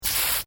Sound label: AEROSOLE SPRAY CAN SPRAY SHORT, Can of spray paint; Spraying; Objects